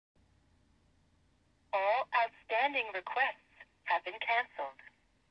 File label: busy redial cancel, Verizon